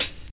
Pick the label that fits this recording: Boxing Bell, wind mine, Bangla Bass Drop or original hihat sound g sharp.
original hihat sound g sharp